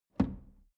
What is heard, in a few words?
drawer medium close lock medium